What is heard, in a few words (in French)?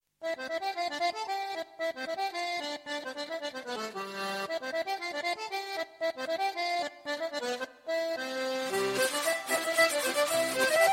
Polka, France